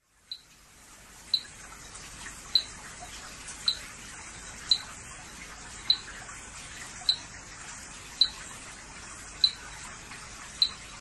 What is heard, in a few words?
Mantella cowanii; HARLEQUIN, BLACK GOLDEN FROG